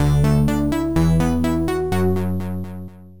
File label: Level Start; solid gold amiga 2013; Landhaus